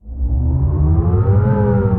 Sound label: Rise; Tree Tone Presets; Press Keys; Ableton; Factory Packs; Inspired by Nature; Dillon Bastan